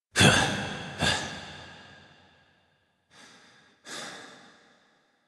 Embrace Breaths; gameplay; Arthur; Warframe; NineteenNinetyNine; Stage12PartyOfALifetime

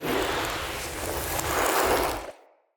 Staff Caster Charge Heavy; Weapons; Player; Staves; Starter

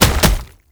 Proto Infested Gallop; Enemies; Steps; Warframe; Brachiolyst

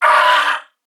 Pain Corpus Venus Male, en; Warframe; CorpusVenusMaleB

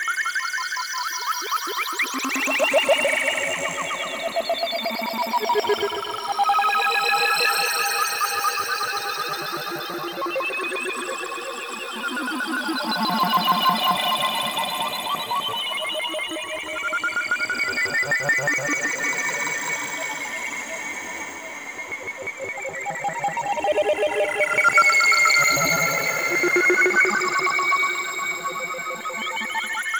Mushroom Patch Stereo Loop, Ambience; Props; Warframe; Nokko Colony

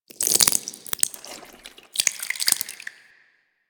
Infested Ancient Taunt Flesh; Warframe

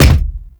Sphere Projectile Bounce; Warframe; Warframes; Gyre

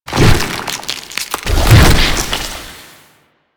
Proto Infested Babau Rolling Rot Start, Enemies

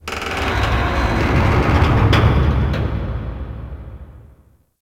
Base Large Metal Creak; Star Base Intro

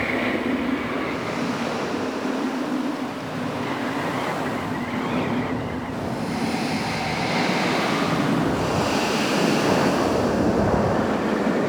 Settlement Ravine Exterior Ambience; Warframe; Grineer Settlement

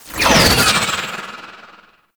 Archgun Proj; Warframe; Archwing; Nokko

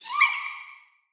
Vocal Boost, Animals; Player; Vocals; Player Floppet

